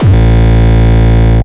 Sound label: Frenchcore Kick